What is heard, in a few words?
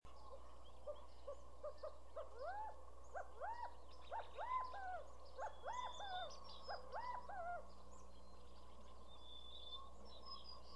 Quail; Garden